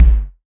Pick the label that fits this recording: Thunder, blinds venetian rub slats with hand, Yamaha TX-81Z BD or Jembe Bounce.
Yamaha TX-81Z BD